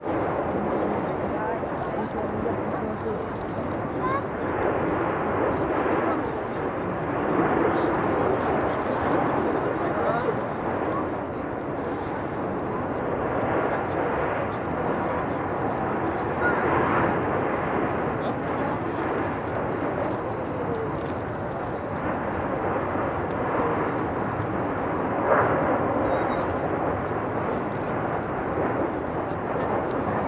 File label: Eruption, Soundscape